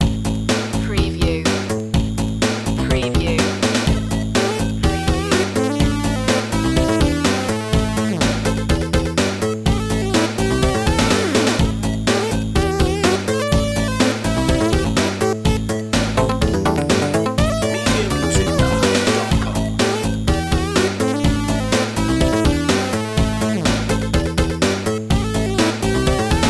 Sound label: retro video game